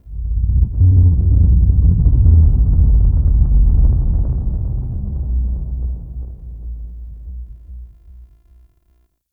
Deep Earth Rumble; Warframe; Tau

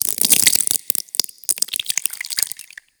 Infested Ancient Taunt Flesh; Warframe

